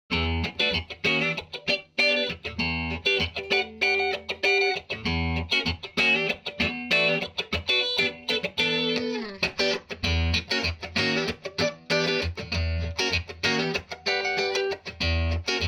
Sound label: guitar clean crunch